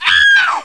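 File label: female scream; hl2; citizen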